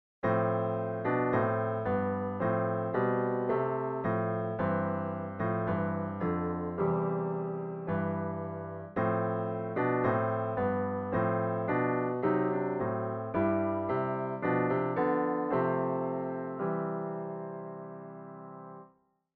12-TET II 2ND 9-8 5TH 3-2

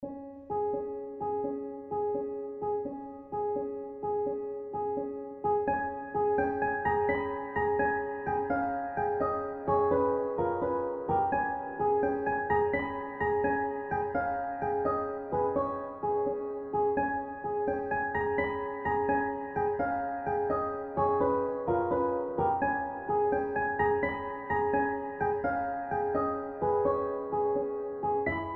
Celestial Satin Piano

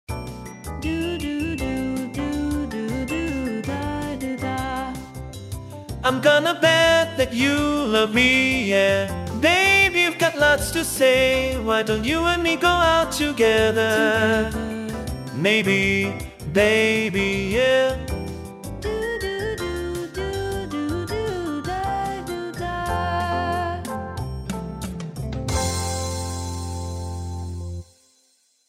POP

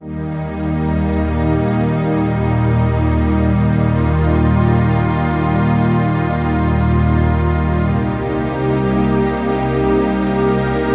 Rich Strings

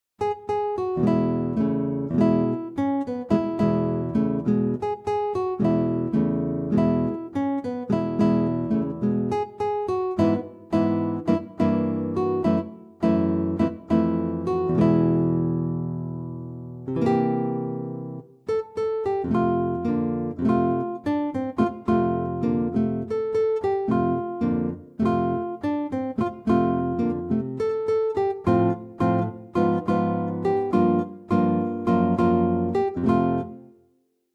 Chord Shapes

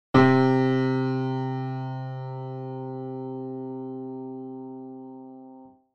metallic buzz

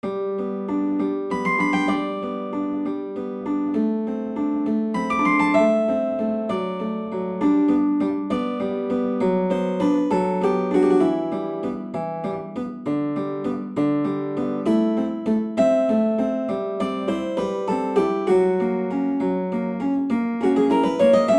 Neupert, Clavichord; Pianoteq; Modartt

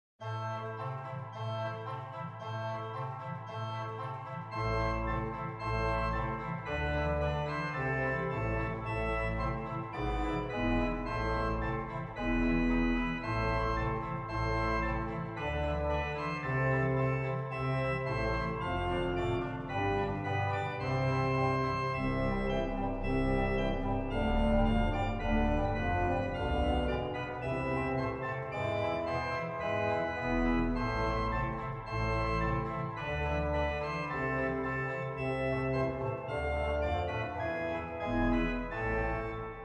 Piano Grade 1 Finger Exercises Ideas

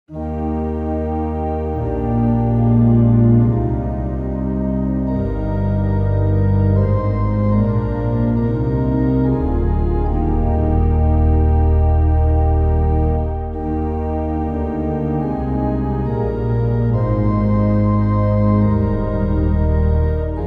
Abide with me, Pianoteq and Organteq; Modartt

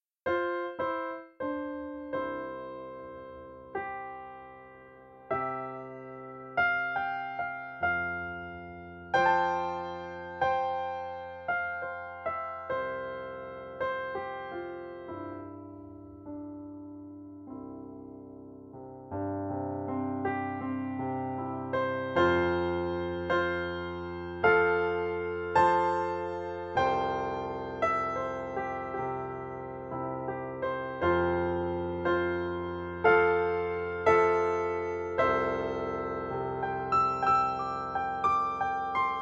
Rock Gospel

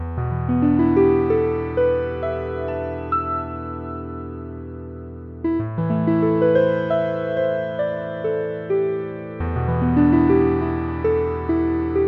Semi Acoustic

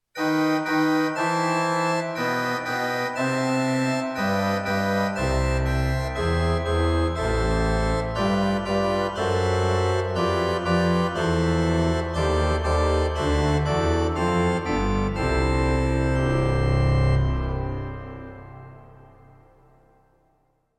Augmented Pipe Organ II

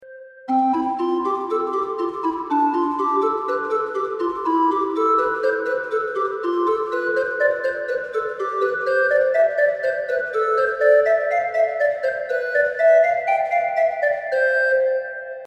dynamic organ Gedact HANON

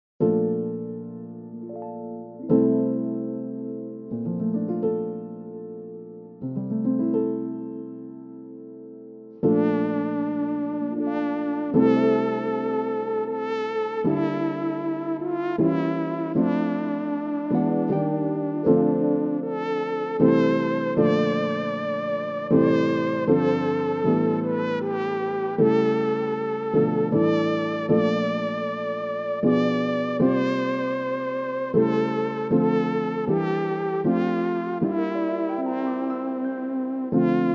Scarborough Fair Reharmonization; Scarborough Fair - Reharmonised; Pianoteq; Organteq

